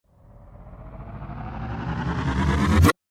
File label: Dance Riser; Rise And Dive Sound Effects